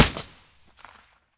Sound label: aps silencer mono; STALKER; IWP